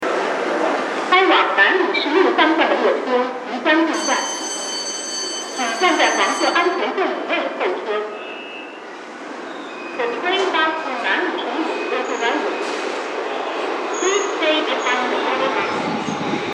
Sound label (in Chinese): 1号线南礼士路方向进站